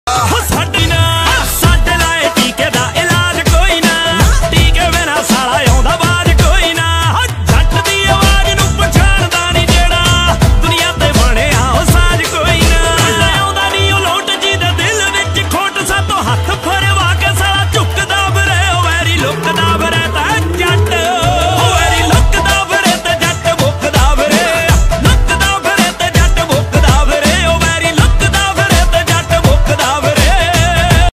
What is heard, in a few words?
punjabi ringtone